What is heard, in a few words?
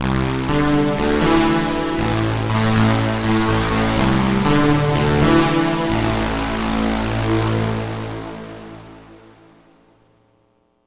Noisy Gate, Synths; Sonic Foundry (Sony Creative Software); Modular Electronica